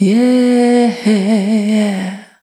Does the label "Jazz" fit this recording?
No